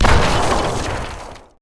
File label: oil impact; Gamestar